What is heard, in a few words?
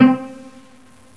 PIZZ; Strings & orchestra; GMTones